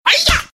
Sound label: 3d Ninja Sms